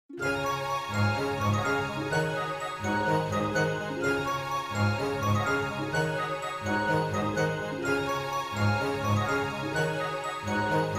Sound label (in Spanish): sop y contraltos; algo nuevo